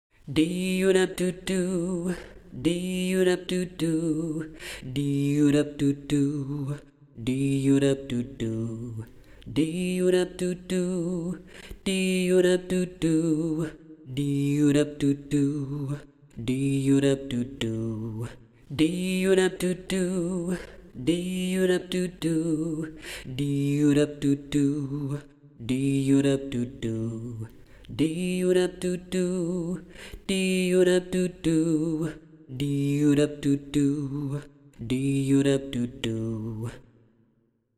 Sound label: basse octave